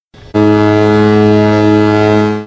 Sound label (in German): klingelton nebelhorn; schif; aida